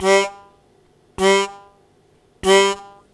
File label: Short Attack G0, Harmonica; Zero G - Harmonica